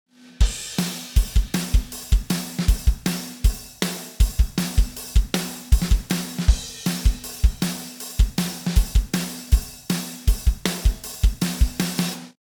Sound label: drums eq